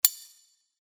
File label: Sword Sound; Fighting Sounds; FXHome